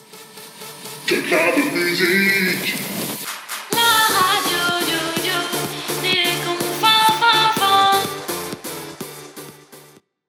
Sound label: JINGLE